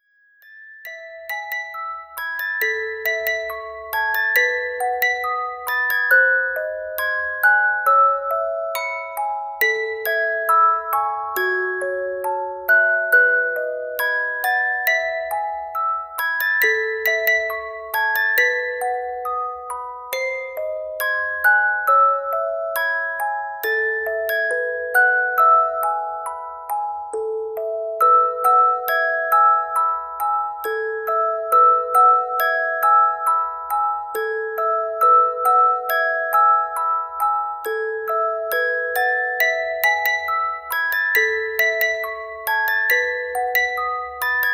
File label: pause loop; Le Fishe au Chocolat